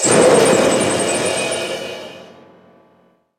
spell throw

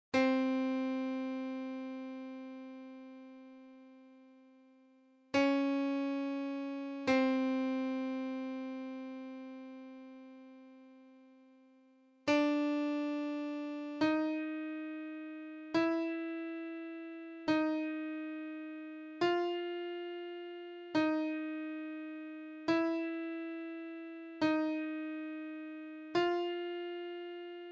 BGM

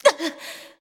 girl damage; Aion

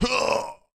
male die; Aion

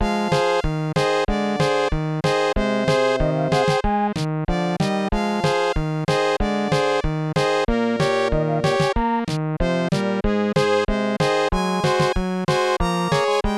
Unused Song; Animaniacs SGB

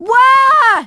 Flying Car

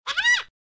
Menu sfx unused

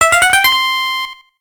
Unused Jingle; EVO

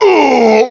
Damage Fly; SSB Brawl; Ganon

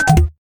Spider Bounce; Sonic Mania

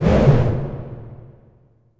spell oops

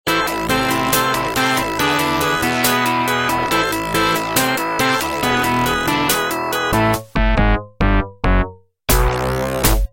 game over; Sonic spinball

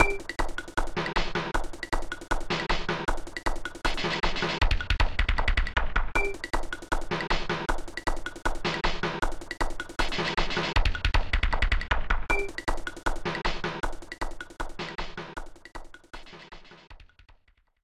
Unused Song; Sound Factory

